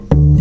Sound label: Upright Bass Notes